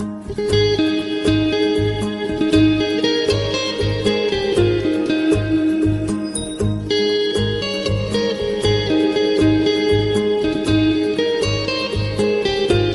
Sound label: instrumental